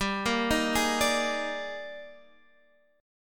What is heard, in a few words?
Eb Major 11th G